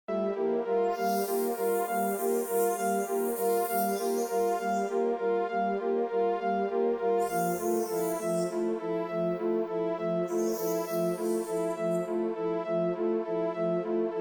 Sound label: Electricity background music; Nokia Ringtones; Audio themes